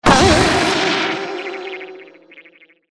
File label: Wood Joint Break; bad piggies; android; mobile; windows